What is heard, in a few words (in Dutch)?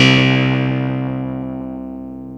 Metaalbewerking, FM; Polyend